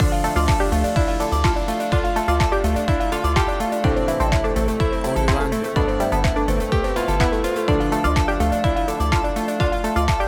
Arp House; A cool arpeggio dance music

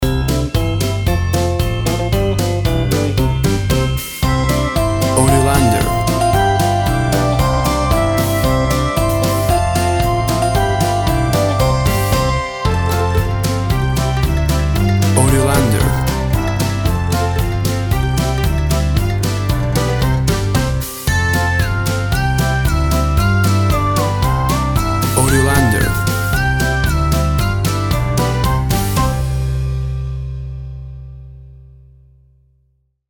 Upbeat Happy Kids Music

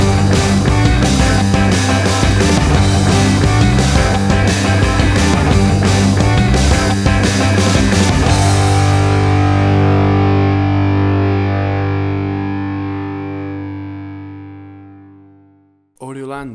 Big and Bold Pop Punk Rock Music

